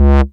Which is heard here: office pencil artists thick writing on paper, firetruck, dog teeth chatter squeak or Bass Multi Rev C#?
Bass Multi Rev C#